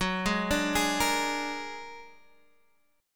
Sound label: A sharp Augmented 7th, chord